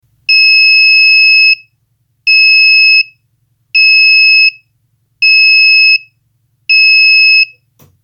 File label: V Buzz